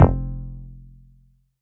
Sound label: bass F1, Casio VZ10M; Polyend; Simulacrum Sounds; 1 Shots; Synths